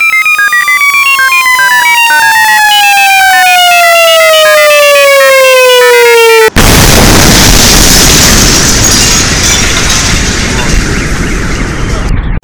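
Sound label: alarm clock hard landing ufo; budilnik zhestkaya posadka nlo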